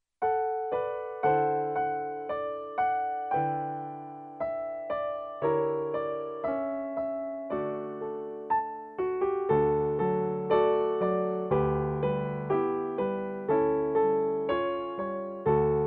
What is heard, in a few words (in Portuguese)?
Boa noite PIANO